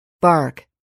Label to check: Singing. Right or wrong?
Wrong